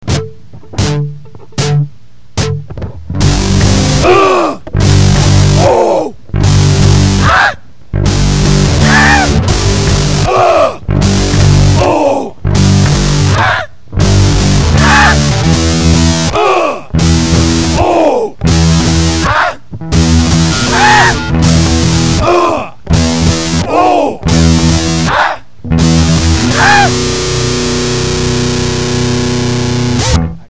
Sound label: Uhh Ooh Ahh Ahh